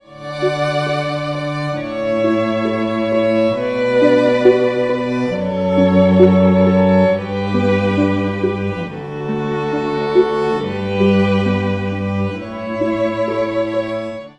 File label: Quartet